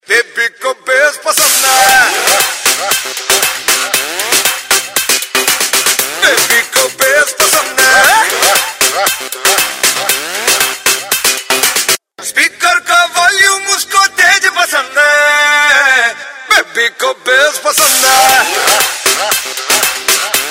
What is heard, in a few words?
Bass